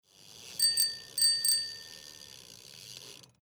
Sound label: bicycle cling; time-lab